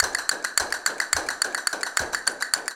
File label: CASTANETS, Flamenco; Best Service ProSamples vol.46; AKAI